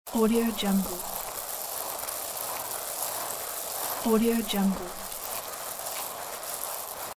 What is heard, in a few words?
mixing coffee seeds loop; domestic sounds